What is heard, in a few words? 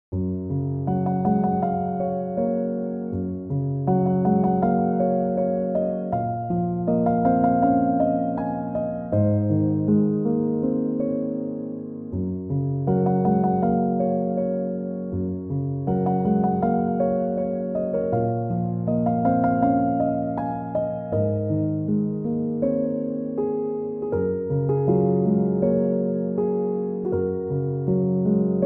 chill piano instrumental